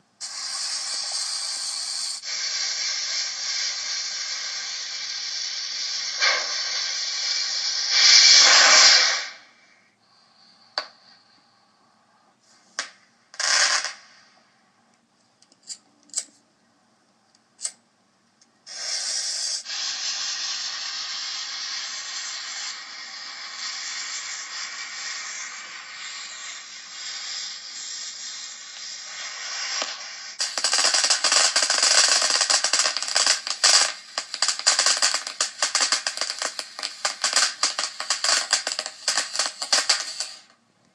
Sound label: crackle